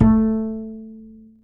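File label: acoustic bass soft A 3; Masterbits CLIMAX 7 - Bass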